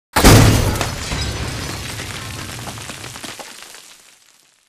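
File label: explosion close debris; corporate; Titanfall 2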